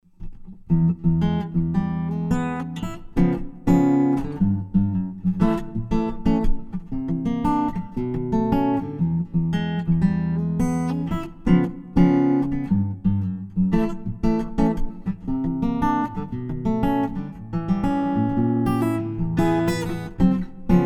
Fishman Aura Spectrum Jumbo Picking Ibanez AW54 Blend Comp